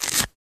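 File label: Grapefruit Tear Short; gore stabs & eat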